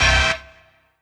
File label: HOUSE, House Dance